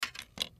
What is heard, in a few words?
MedicalScientific; Soundfx III